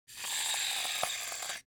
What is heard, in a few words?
object cosmetics shaving cream dispense; smartsoundfx